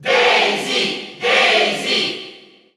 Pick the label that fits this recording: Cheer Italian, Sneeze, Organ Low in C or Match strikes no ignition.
Cheer Italian